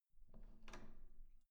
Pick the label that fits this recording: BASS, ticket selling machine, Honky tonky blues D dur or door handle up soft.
door handle up soft